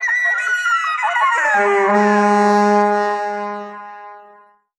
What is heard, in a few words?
frantic sax